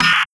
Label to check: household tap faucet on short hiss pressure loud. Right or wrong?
Wrong